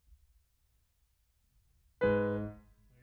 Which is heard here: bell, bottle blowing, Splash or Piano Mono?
Piano Mono